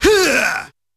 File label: F#4 Huuaah!, Yamaha PSR-E323 Sound Effect Kit